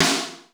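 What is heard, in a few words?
processed snare, drums; Crypton - DrumBase5000; PROC.DRUMS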